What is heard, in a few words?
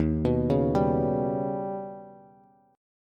Eb Augmented 7th Eb down Bass; Eb+7 arpeggiated; JGuitar